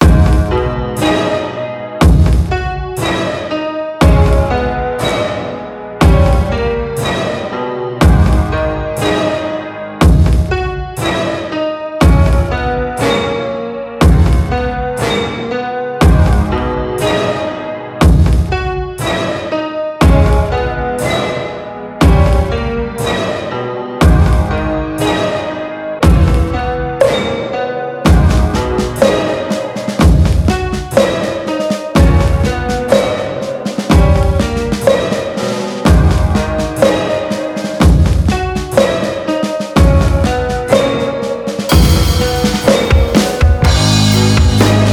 instrumental

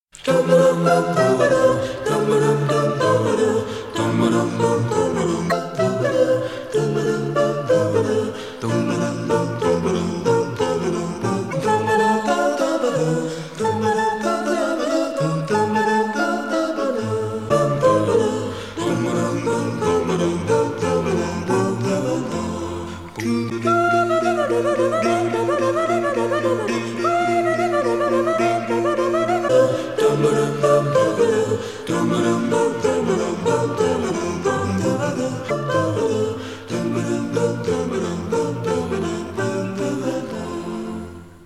Mazurek F dur

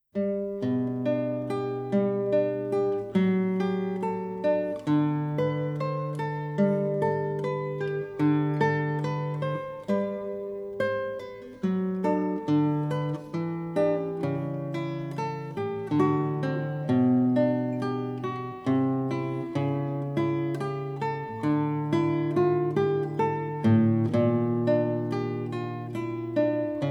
Suite in G Major Gavotte for Guitar

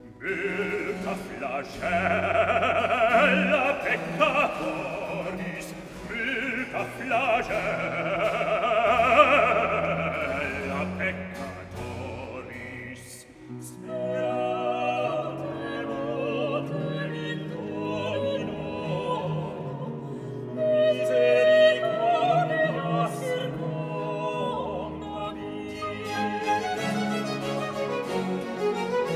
Bass; Beati quorum remissae sunt; Multa flagella peccatoris; Soprano

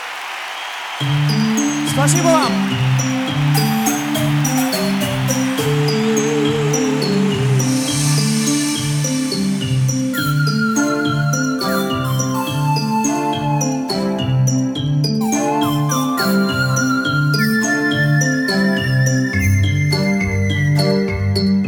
Instrumental

